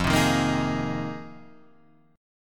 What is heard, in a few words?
C Minor 7th Sharp 5th, F, chord; strummed; JGuitar